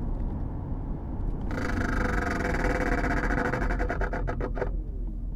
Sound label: rattle